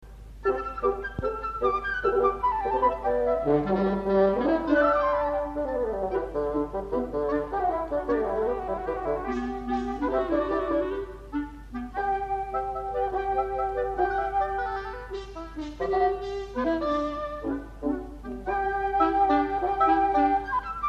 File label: Wind Quintet